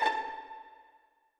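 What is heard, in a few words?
orchestra; Masterbits - Switch FX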